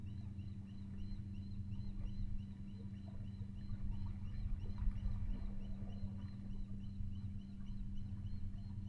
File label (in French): balbuzard; scandola 2018